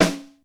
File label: XE8 snare; Crypton - DrumBase5000